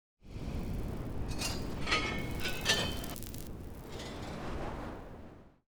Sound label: crunch zones 3D